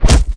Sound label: hit